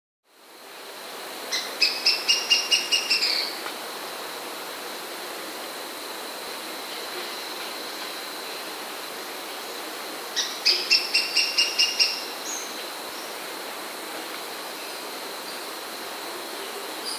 Plumbeous-Crowned Tyrannulet (Phyllomyias Plumbeiceps), Andean Birds; S. America